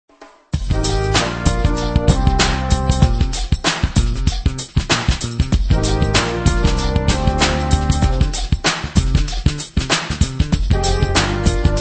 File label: Outside Jazz